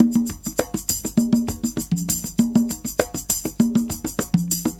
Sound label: Percussion, R&B Drums